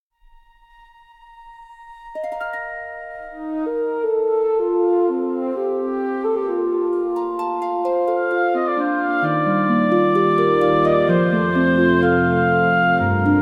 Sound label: fantasy music nature forest; ghibli style; wind healing nostalgia